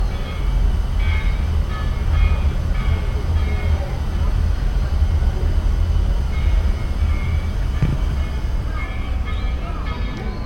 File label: hiroshima flame